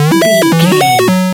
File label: retro synth beeps